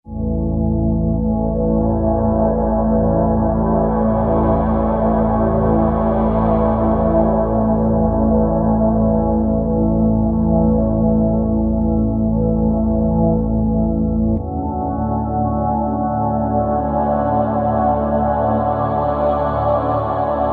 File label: Padus; Factory Patches